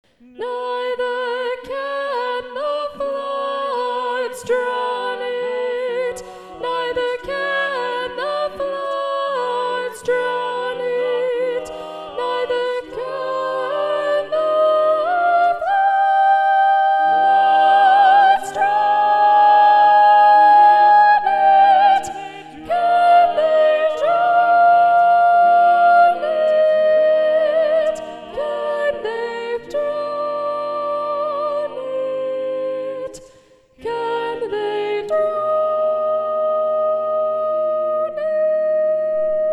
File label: SATB Soprano 1 Predominant